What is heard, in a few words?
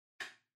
Wall Outlet Far Wall Lock